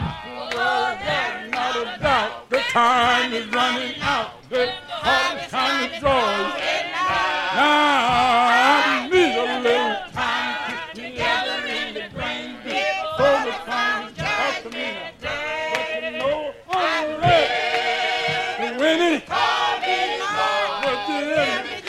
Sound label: Sacred Harp Singers; Wiregrass Sacred Harp Singers